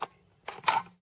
breda30 open; Weapons; Guns

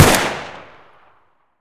rifle, Guns Fire; Weapons

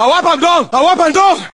charge, Voices Emotes

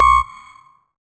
beep, supermatter grenades

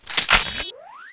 kinetic reload; Weapons; Guns; Mag In

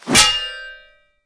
blade parry, weapons melee

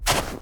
snow step, Footsteps

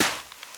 sand step, Footsteps

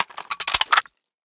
clip reload, Guns; Weapons

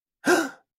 man gasp

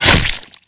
stab, Gore; Civ

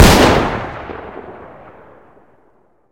shotgun, Gunshots; Weapons

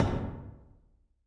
hull, Footsteps; civ13; civ14

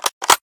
arisaka open; Weapons Guns Interact

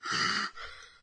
female death gasp

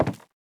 wood, footsteps

